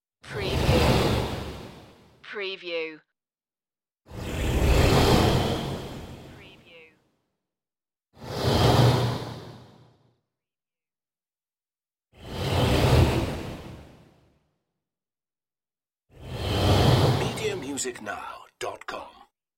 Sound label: starship turn swooshes